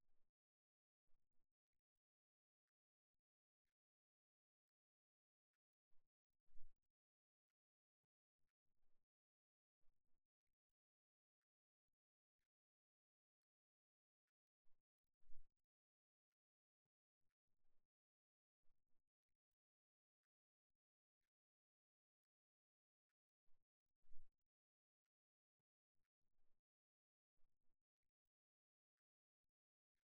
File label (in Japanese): 時計の針